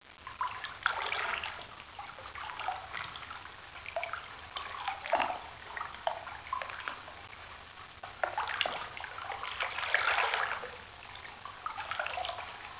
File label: water lap